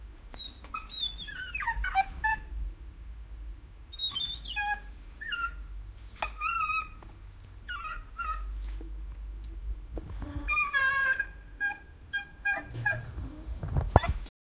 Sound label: rabbit squeaking; animal behavior